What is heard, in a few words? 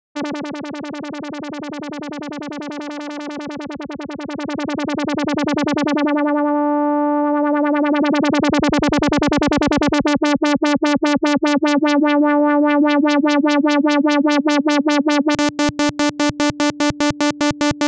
1 Osc 1 Filter LFO Test; Poly-Ana